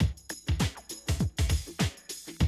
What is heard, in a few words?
drum loop, 100 BPM